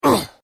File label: Pain Umph, Male Painful Grunt, Hurt; human sound effects; Lynne Music